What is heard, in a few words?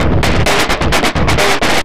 Beat, Industrial